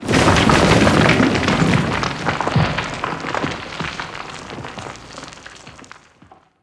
junk debris, ambient

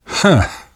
laughter

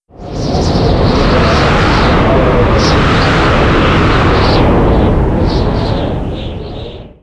stereo gust; rainscapes

